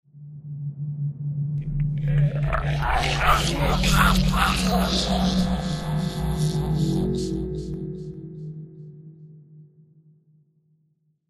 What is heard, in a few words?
gore jingle